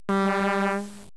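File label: TRAFFIC, car sounds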